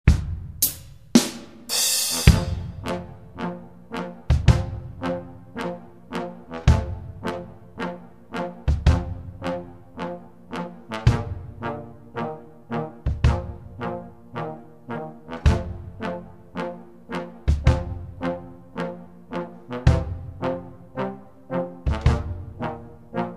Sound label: jazz